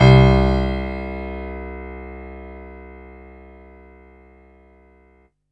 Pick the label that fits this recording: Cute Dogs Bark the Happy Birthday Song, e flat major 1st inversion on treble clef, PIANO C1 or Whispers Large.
PIANO C1